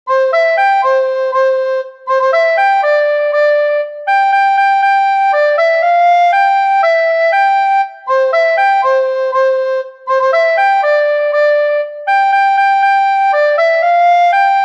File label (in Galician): FRAUTA DOCE